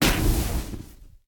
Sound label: sun impact; smite; khepri